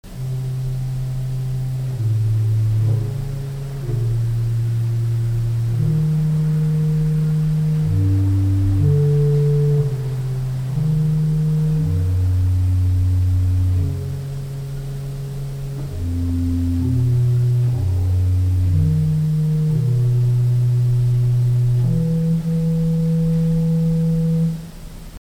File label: Organ Swell Bourdon 16